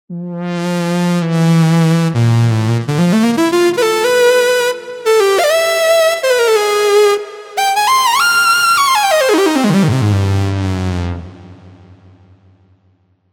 Mega Syn; TURBO VL

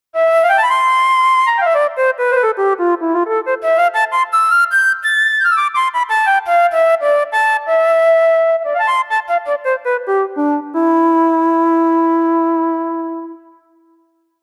Flute; Kontakt Wind Paks

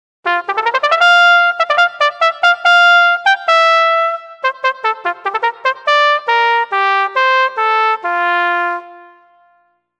Cornet; TURBO VL

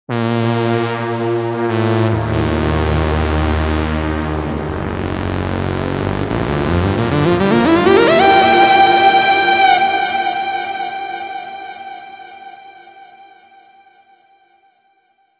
Syn Zilla; TURBO VL

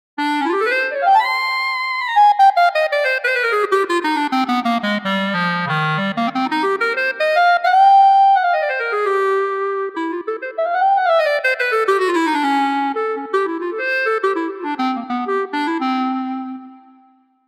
Clarinet; Kontakt Wind Paks

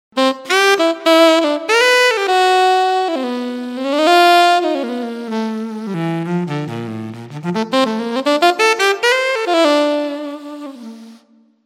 Tnr; TURBO VL

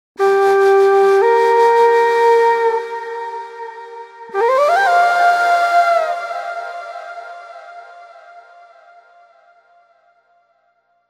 India Flt; TURBO VL

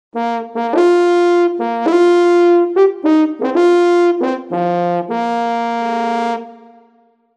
Horn; TURBO VL

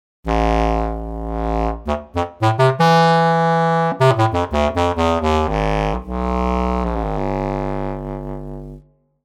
Bs Clrnt; TURBO VL

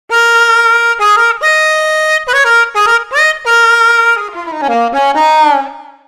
Blues Hrm; TURBO VL

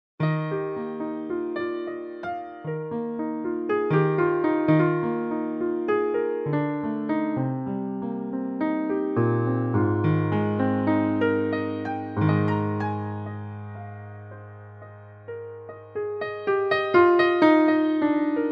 Mega Piano

